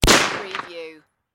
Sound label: Submachine Gun MP40